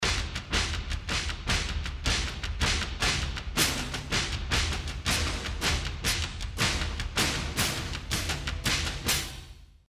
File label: tambour, yamaha tg500; Percussion: bronx